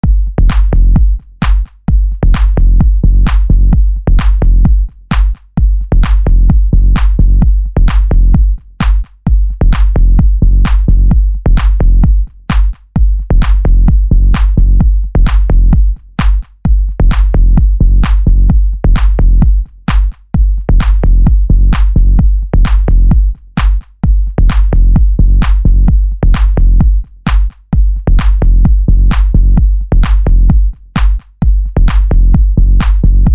base bass tum dum mega funk; pack bases mega funk 2020

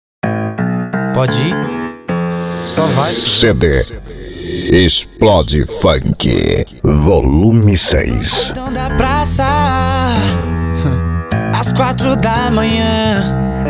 explode funk

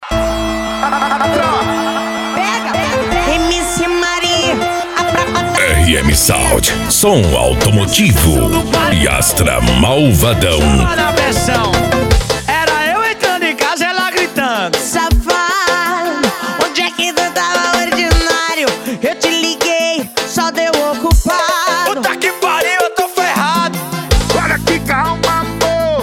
som automotivo

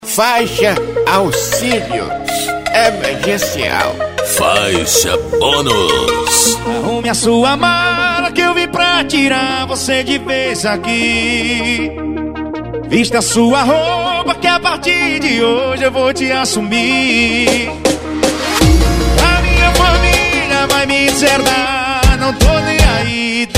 funk e sertanejo

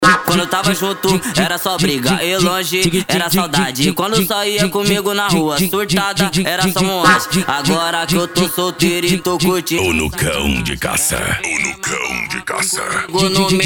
funk ritmado

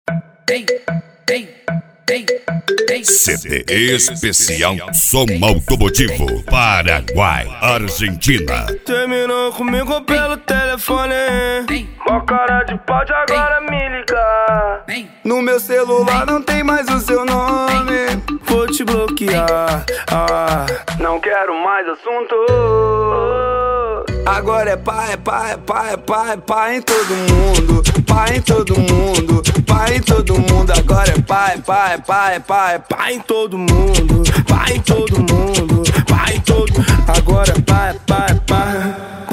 som automotivo

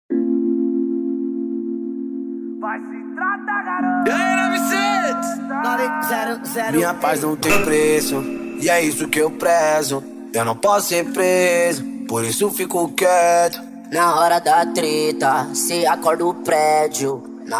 deep house e pisadinha

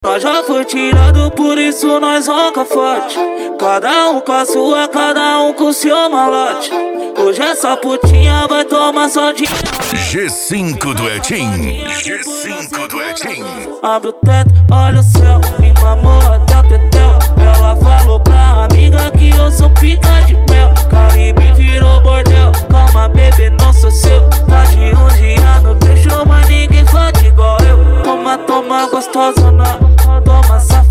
mega funk e funk mega bass

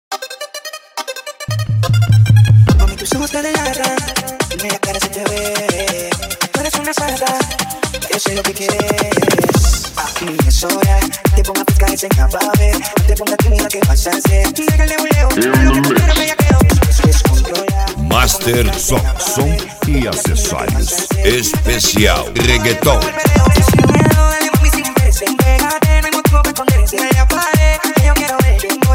reggaeton

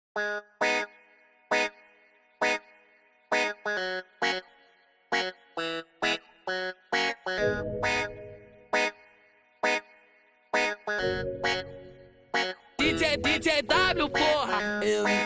graves bass

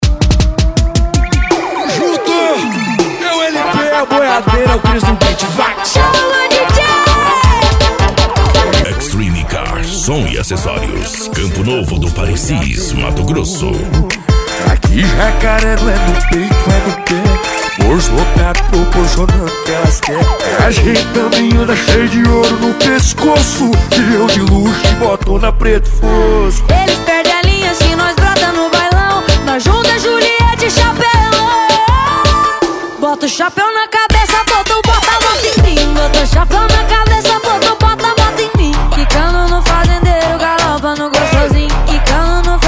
piseiro e sertanejo

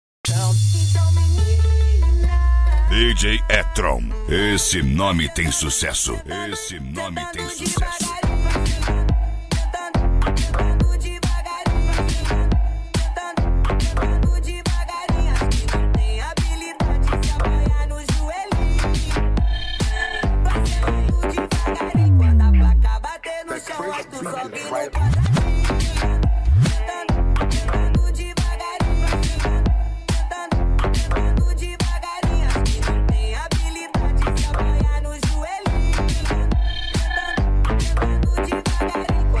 mega funk funk

